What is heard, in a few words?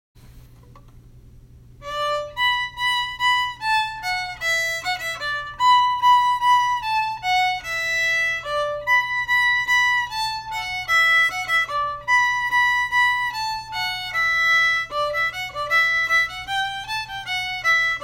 An Dro in B minor harmony